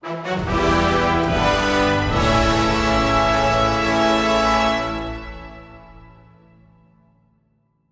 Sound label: Level Up Stinger